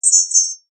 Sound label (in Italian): ciottoli; acque